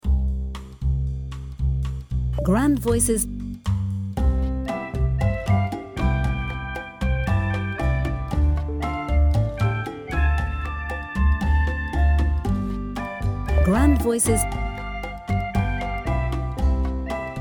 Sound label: MUSIC RELAX